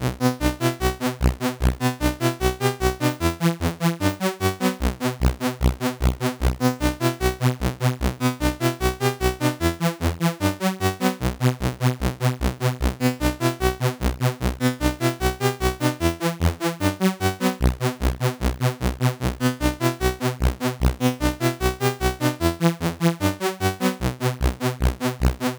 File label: c64 in game; lazy jones